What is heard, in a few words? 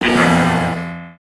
friendly fire; aronwk collection; ndaudio